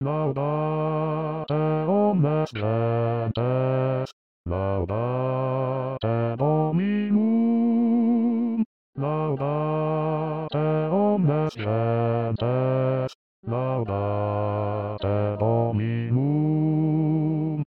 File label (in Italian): Bassi; Laudate Omnes Gentes